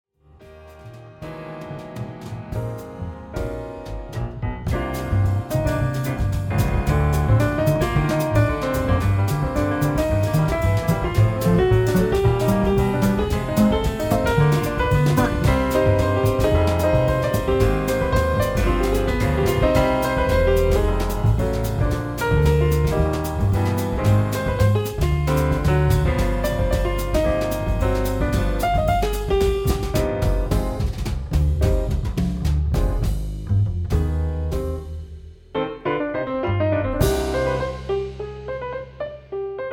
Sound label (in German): Fuge c-moll